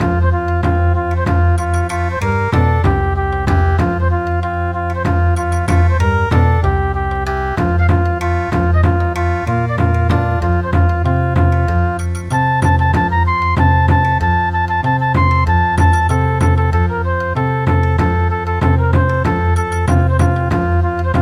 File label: Bon Odori